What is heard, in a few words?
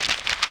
page flip